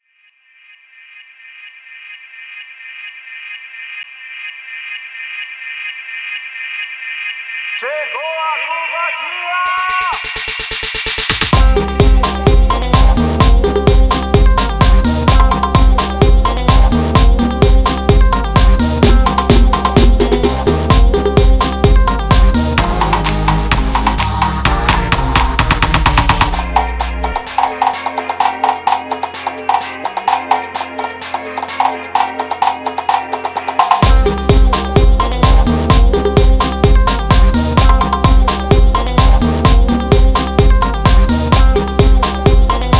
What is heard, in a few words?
groove in rave instrumental